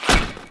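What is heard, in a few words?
axe hit thrown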